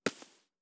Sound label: Mouth Puff